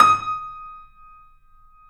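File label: piano, D#, left; InVision Interactive - The Piano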